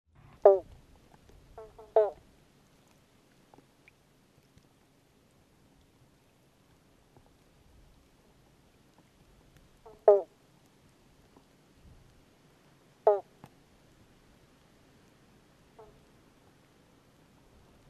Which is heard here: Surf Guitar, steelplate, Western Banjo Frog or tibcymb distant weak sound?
Western Banjo Frog